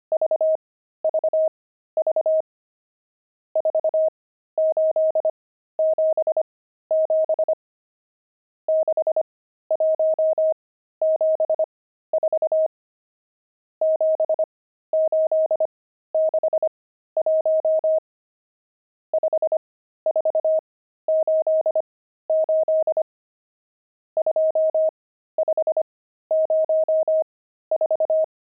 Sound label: pangram, intermediate pangrams files